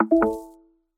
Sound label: iphone x wireless charging started tone